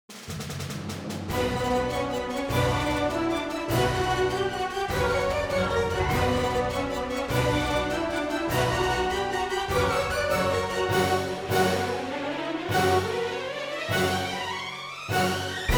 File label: Action Urgent; rwby grimm eclipse